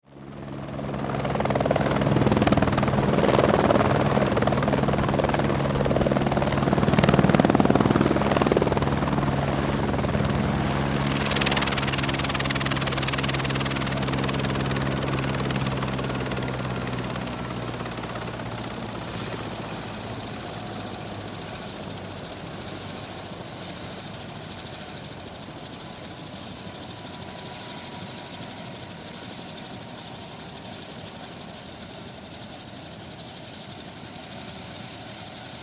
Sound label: Helicopter Turn Off, A helicopter comes in for landing, slowing; slowing down, settles, lands, engine coming to a stop and turn off; Helicopter Sound Effects